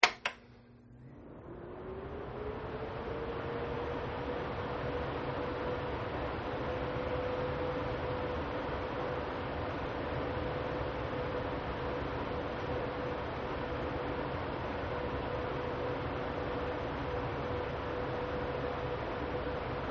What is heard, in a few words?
Tower Fan Low, Tower Fan Running At Low Setting; Fans; Electronics